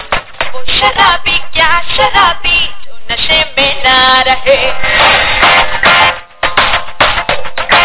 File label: drum